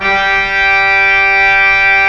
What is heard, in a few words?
REEDS G3, ORGAN; AKAI S-Series CD-ROM Sound Library VOL-8